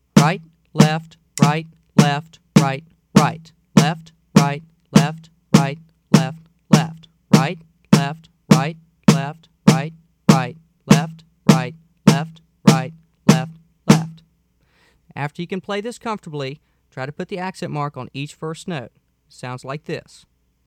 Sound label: Rudiment Slow